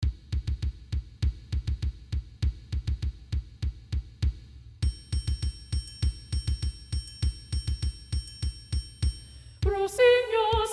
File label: Treble 1 Predominant; La Violette